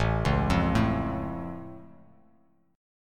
A Augmented A down Bass Standard; arpeggiated; JGuitar

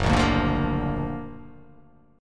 Gb Augmented Major 7th Gb down; Gb+M7 strummed

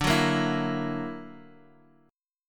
F sharp Augmented 7th, D

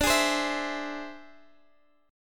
E Augmented 7th D

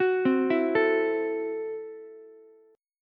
F sharp Minor 7th Flat 5th F sharp Ukulele; arpeggiated; JGuitar

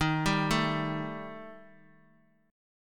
Eb Major Flat 5th Eb

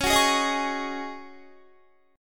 Db Suspended 2nd Suspended 4th Db Guitar; strummed; 21,21,18,20,20,20

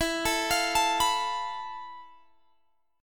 F Major 7th Flat 5th E, guitar chord

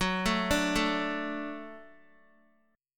Gb Minor Sharp 5th, Guitar; arpeggiated; x,9,9,7,9,x; 4F,4A,5C,5G,5C,6E; JGuitar

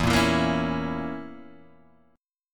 Bb Diminished E

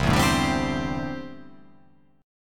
C 6th Add 9th C down

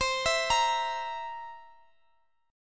C 7th C, Guitar Open D, chord

